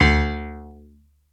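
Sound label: PNO D1, piano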